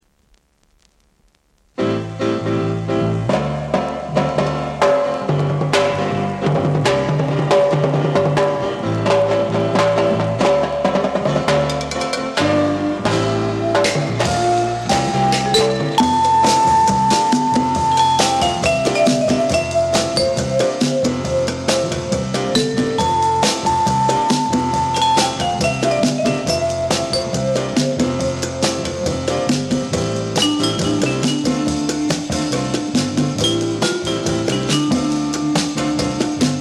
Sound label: Afro Blues Afro Rock